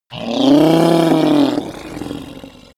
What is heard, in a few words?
reptile talking pain; kreaturen